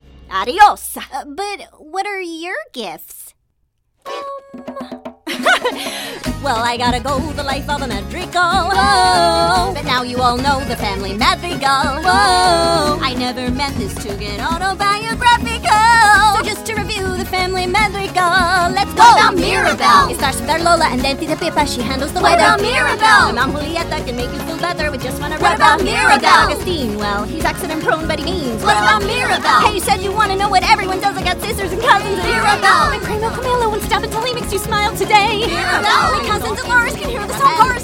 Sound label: Three Singing Styles